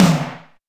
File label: SD BONUS, Drums